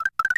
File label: Yoshi Egg Break; SMM; SMW